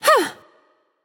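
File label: grunt attack, female; smite; voicepack; hera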